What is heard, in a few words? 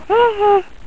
haha